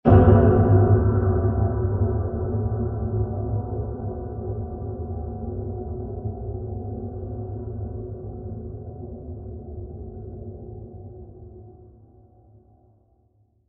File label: Mysterious Metal Impact Blast; Impacts; CraftedSignals